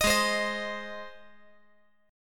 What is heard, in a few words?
Ab Major Ab; strummed; JGuitar